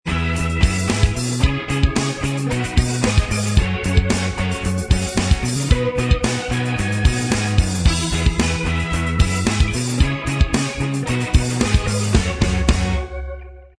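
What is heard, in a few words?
instrumental, backing tracks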